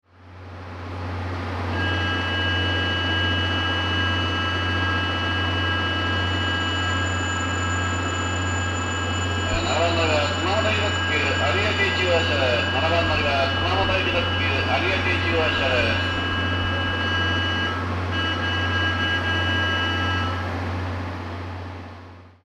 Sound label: hakata bell; jrkyushu